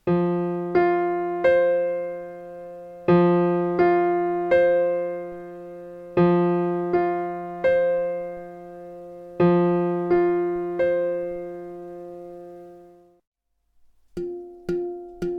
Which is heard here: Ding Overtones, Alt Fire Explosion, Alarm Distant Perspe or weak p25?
Ding Overtones